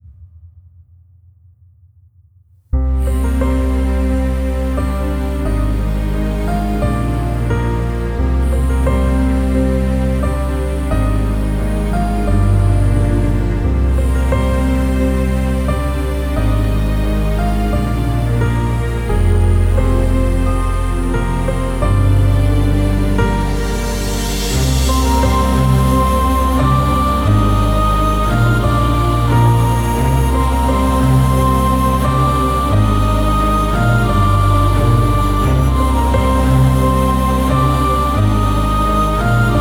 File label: Star Orchestral BGM Cover